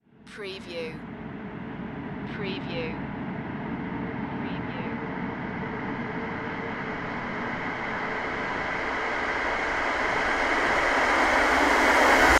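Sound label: Production Element Reverse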